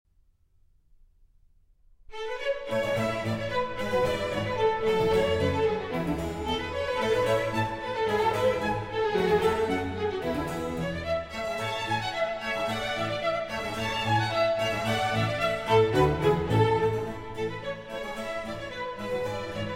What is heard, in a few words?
Concerto for Strings and Continuo in A major